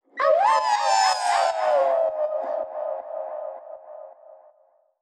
awoo

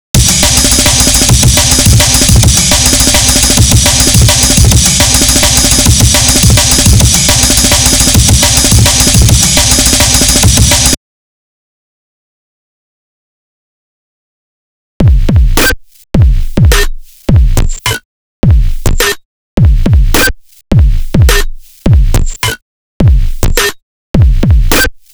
drums

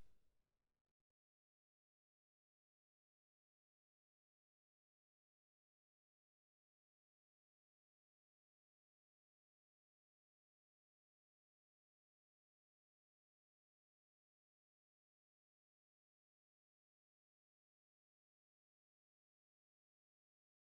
hat

